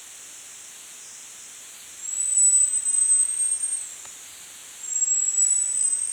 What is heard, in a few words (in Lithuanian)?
Paprastoji marmozete